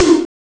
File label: y drum; ST-XX Amiga Soundtracker Sample Packs